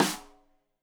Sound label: Rim Shot Stereo; Deeply Sampled Drums; SMDrum